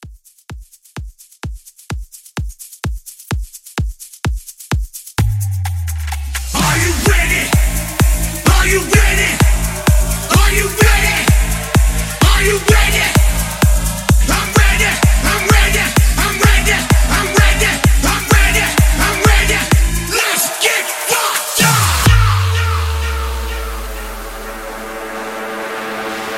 Hype Edit 128 DIRTY

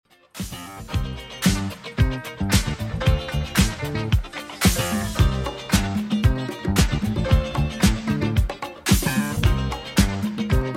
80s Redrum 113 bpm

